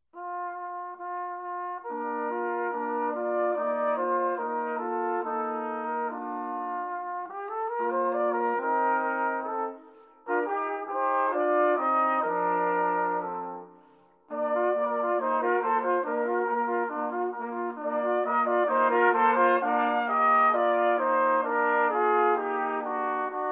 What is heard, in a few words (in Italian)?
Larghetto